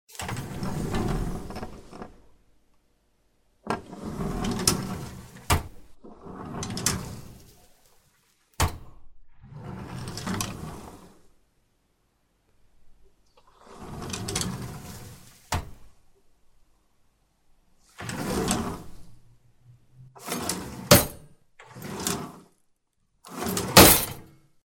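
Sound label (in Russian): варианты открывания и закрывания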